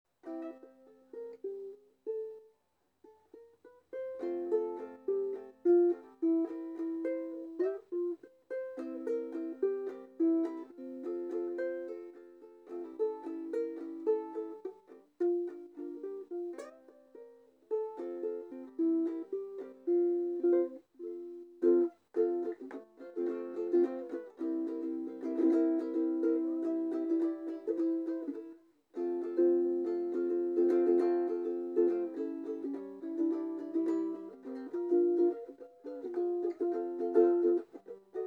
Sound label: ukulele